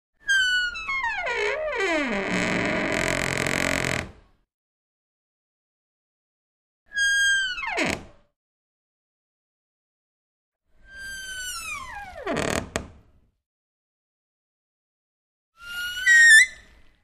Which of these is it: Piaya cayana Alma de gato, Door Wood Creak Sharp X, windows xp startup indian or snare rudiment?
Door Wood Creak Sharp X